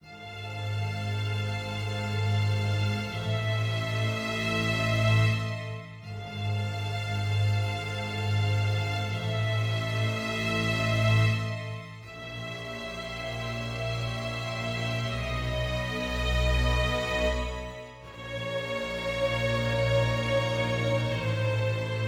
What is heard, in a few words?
dark forte